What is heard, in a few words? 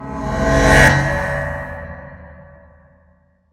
Metallic Swoosh Stretch, slow motion transition sound effects